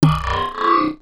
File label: Sci-fi Drone Maneuver